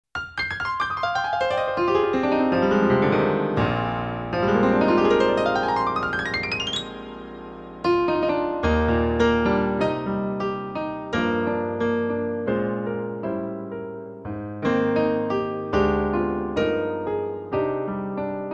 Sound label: Yamaha S80; Plug2; purgatorycreek